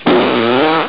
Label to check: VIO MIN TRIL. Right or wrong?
Wrong